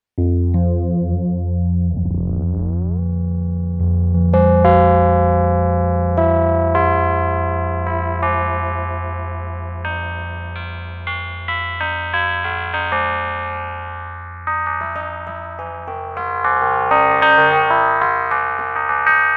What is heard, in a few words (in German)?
Phaser schneller LFO